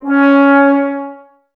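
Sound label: brass trombones C#, Woodwind Brass Ensemble; 90 s Sample CDs; Miroslav Vitous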